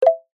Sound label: multimedia tone short two tone wooden